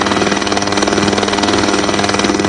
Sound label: Bk117 Rotor